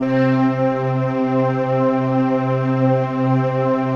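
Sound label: PAD, atmospheric pads; Best Service; XX Large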